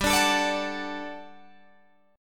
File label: G Major 7th Suspended 2nd, Guitar, Open G; 17,19,19,19,19,19; down